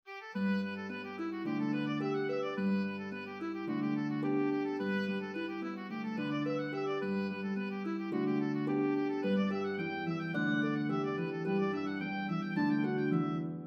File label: Oboe, Duet for Oboe & Harp; This reel is charming as a Wedding Recessional or for a Festive Event.